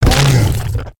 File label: on hit, creatures organic